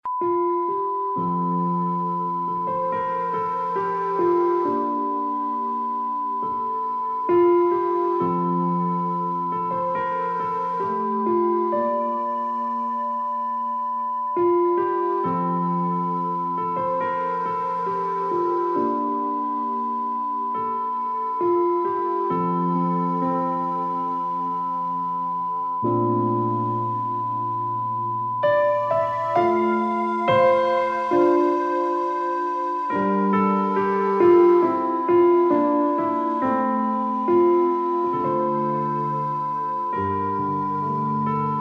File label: smooth melody piano pad